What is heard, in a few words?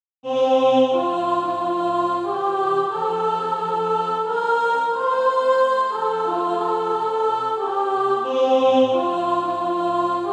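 soprano; Doers of the Word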